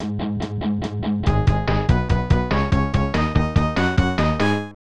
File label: Touchdown; madden nfl 96; snes